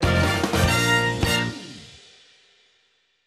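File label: music stinger level complete; Sesame Street Ready, Set, Grover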